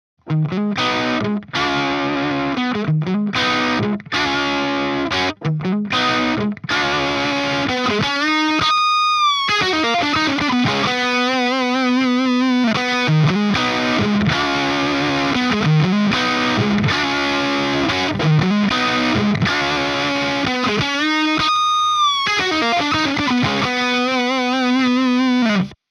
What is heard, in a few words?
CRUNCH C5; SaturnLO